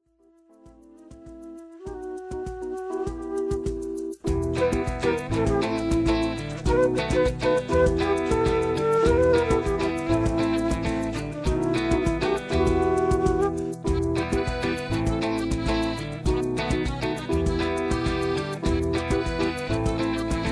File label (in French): le chat samba instrumental